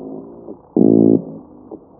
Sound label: Argyrosomus japonicus; Japanese meagre